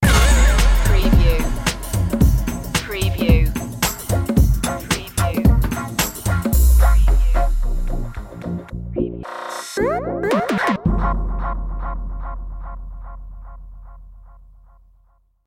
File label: sting